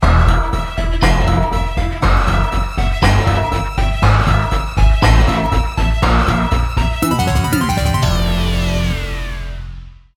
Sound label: Continue; Super Hydorah